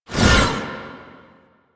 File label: Whip Swipe; Melee; Dagath